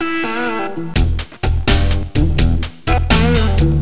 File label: midnight blues theme